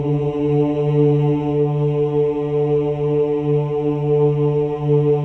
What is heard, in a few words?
CHOIR OOS, D3; Spectrasonics; Symphony of Voices